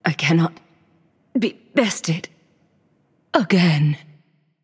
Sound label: death; smite; nox